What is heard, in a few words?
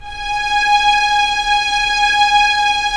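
string section; Roland - LA Composer vol. 1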